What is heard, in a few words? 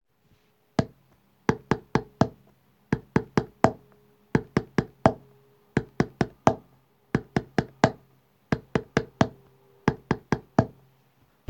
rhythm